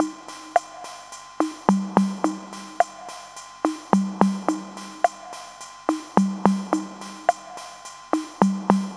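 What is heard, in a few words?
drum 107, 107bpm, Breakbeat; Best Service ProSamples vol.40; Breakbeat 2; Boom Boom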